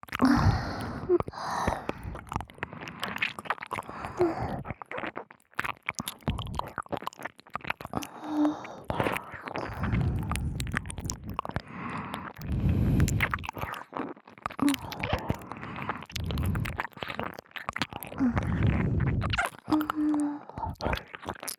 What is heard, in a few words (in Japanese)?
両耳舐めループトラック, ASMR; 光莉＆葵衣の両耳舐めループトラック